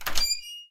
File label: door open